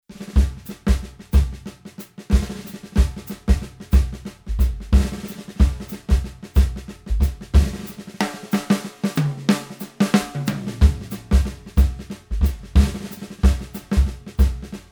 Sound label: bebop jazz